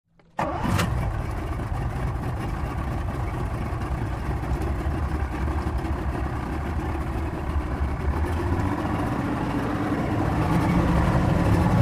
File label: Ranger Boat Start, Hot Revs, Idle, And Off